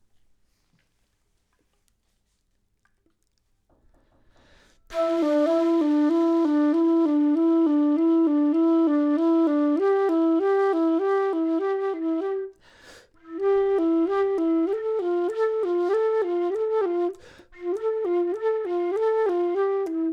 flute

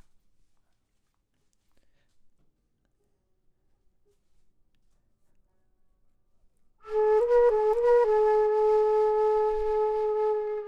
flute low; logic

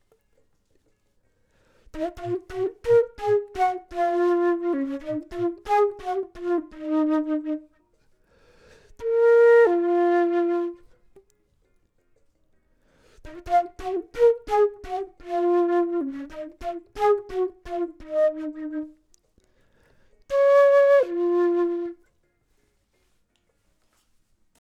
flute; logic

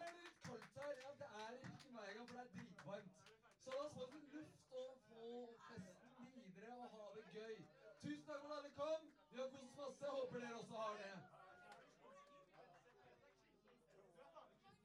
backing

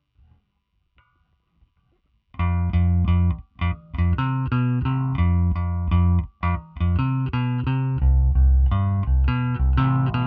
bass

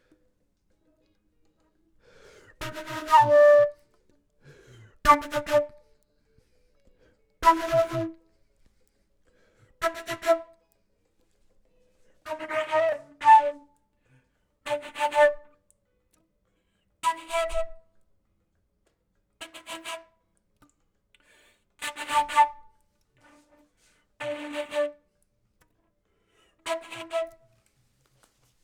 flute; logic

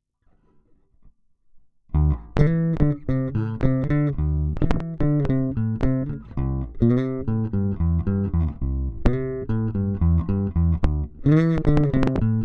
Sub Station

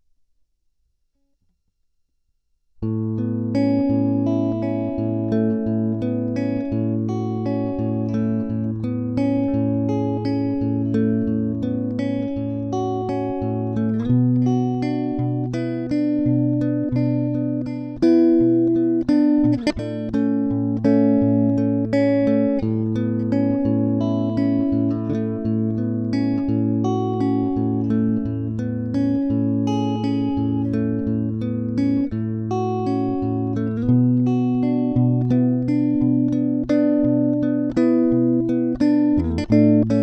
New Wave Chorus

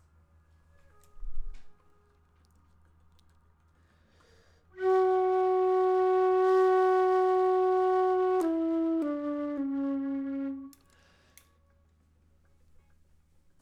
flute; logic

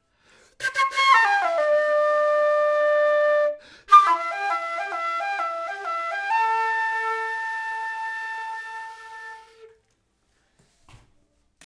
flute; logic

